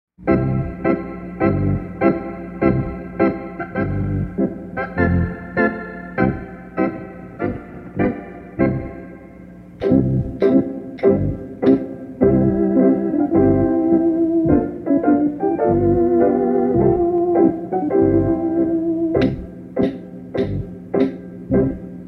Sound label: happy clap dance instrumental